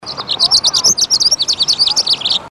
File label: House Finch